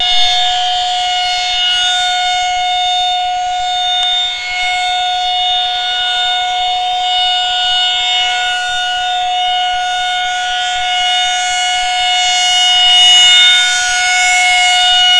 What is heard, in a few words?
tornado siren